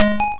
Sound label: YM Perc, DRUMS; ChipShop Deluxe; ChipShop Hits